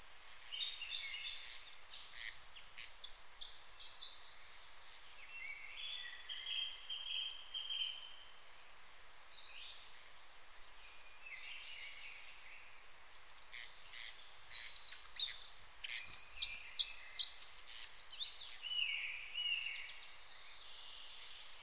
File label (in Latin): F. parva; w locie